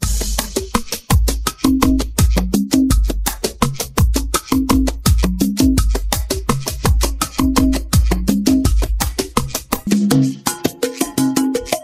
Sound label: DRUMS; multitracks